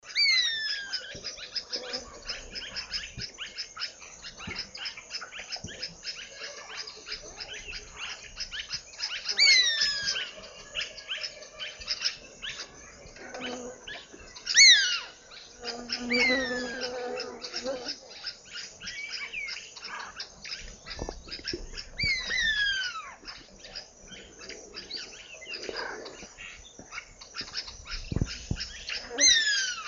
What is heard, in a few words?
Kaupifalco monogrammicus; roofvogels; Accipitrinae; Hagedisbuizerd; Accipitres; Accipitidae